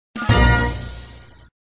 Music, flipper; sttng

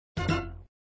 Sound Fanfare End of Ball, flipper

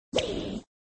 Sound Whip sound, flipper

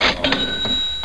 Win

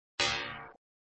System Warning Beep; flipper; sttng

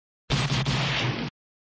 Sound Gun sound; Gun sound v3; flipper

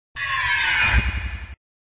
Sound SFX, flipper; sttng

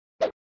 Sound Grail switch, flipper; Grail switch v2

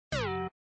Menusound System Enter, flipper; Systemsounds

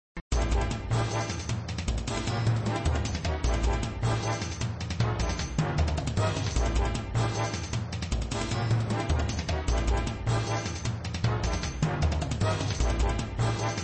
Music Raven bar music (looping), flipper; Indy

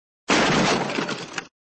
Sound Wood crash (Mine Cart), flipper

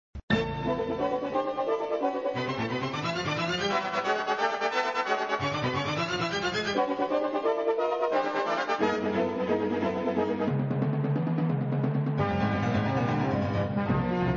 Music Multiball music (looping), flipper

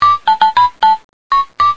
Music Two Bits

